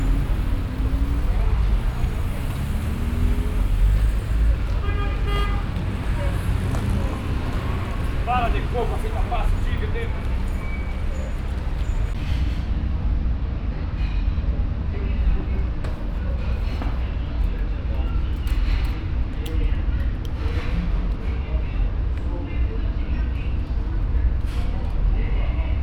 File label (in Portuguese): Na Rua, Fieldrecordings, Brasilien